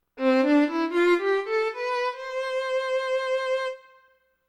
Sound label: violin short